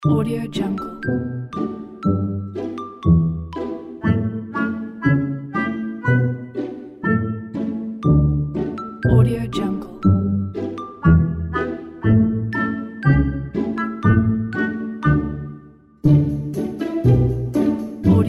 pizzicato music for childrens

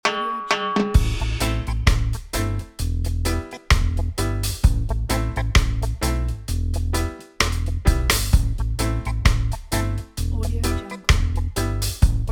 laidback reggae; jeroenfens

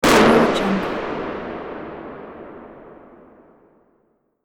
distorted smash impact long

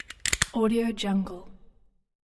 mechanical power plug connect

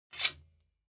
weapons sword scrape fast

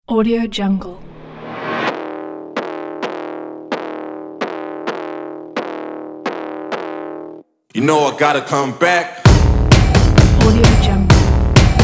dirty beat for sport fashion

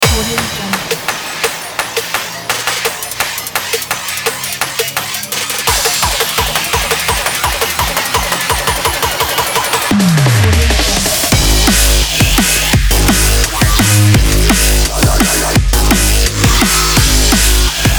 drum and bass

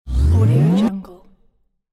riser

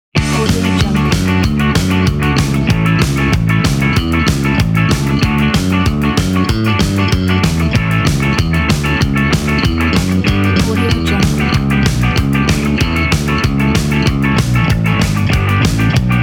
ska punk

